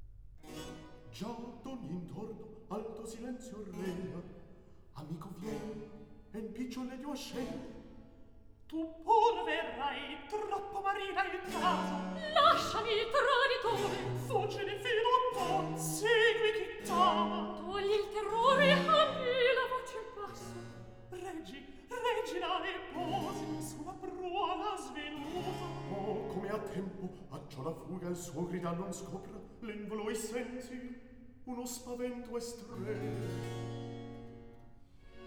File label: Recit; Act II; Già d'ogni interno; Handel; Ottone, re di Germania; Ottone, HWV 15